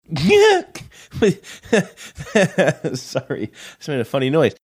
made a funny giggling noise sorry just made a funny noise